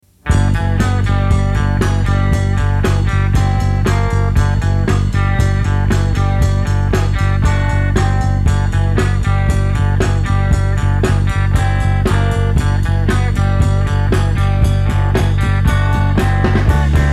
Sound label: Phone Jam, bongos